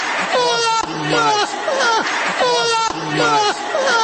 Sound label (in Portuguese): risada; carlos alberto nobrega